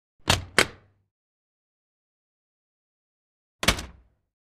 The freezer door of the refrigerator is opened, closed